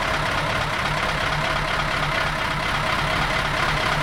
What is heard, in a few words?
diesel idle; rigs of rods